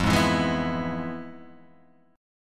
F Minor Major 7th Flat 5th, F, Guitar, Half Step Down